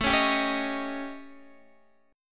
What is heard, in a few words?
A 7th Flat 5th Guitar Half Step Down